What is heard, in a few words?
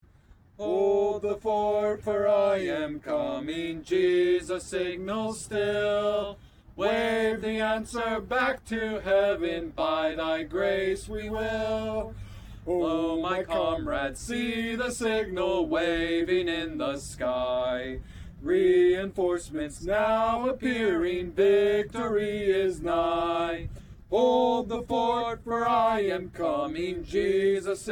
sung by fellow soldiers, Songs Worth Singing; Hold The Fort